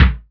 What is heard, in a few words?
Mix Kick